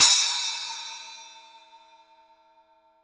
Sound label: CYM, Real Drum Kit, FUNK KIT